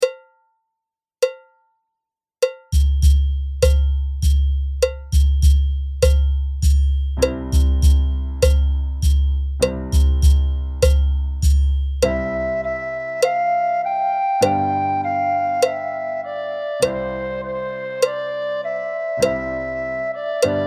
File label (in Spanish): himno de la alegria con claqueta